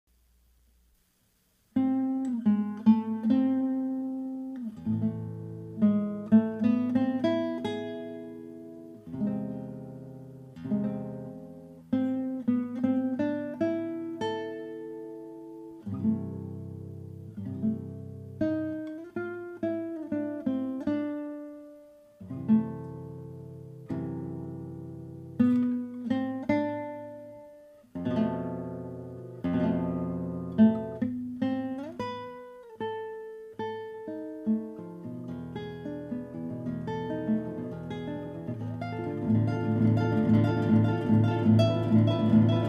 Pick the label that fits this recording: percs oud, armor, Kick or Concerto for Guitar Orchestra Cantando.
Concerto for Guitar Orchestra Cantando